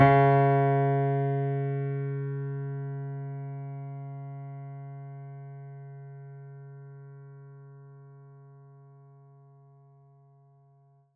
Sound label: mini grand; bananenkeyboard